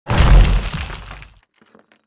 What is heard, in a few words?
Rock Wall Break